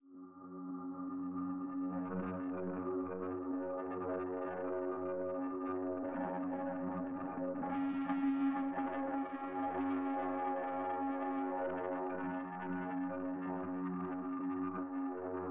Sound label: Magnetic Field; 99Sounds; Magnetic Fields